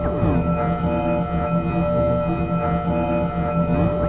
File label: big resonant pad, Atmospherics